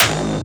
normal hit whistle

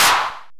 Snare Pop

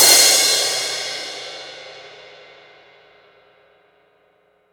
drum hit finish

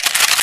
reload

